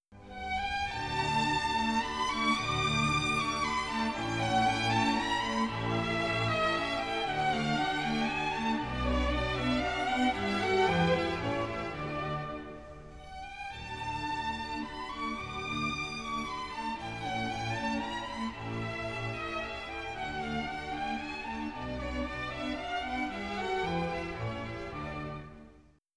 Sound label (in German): nachtmusik, trio; mozart